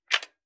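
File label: gear rattle weapon medium; viper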